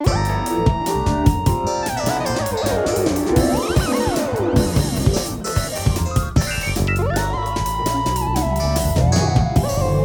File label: Cinematic Music - Funk Exploration; littlebigplanet karting ps3 gamerip 2012